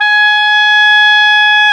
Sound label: OBOE, mf f; Roland L-CDX-03 Disk 1; Oboe 2&3